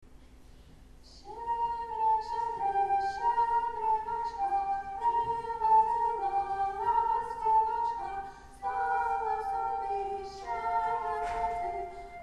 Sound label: Carol of Bells singers; Traditional